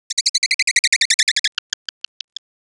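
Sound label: strong response call, Nashoerner; Kommunikationslaute; emotionale Rufe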